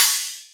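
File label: R8 CRASH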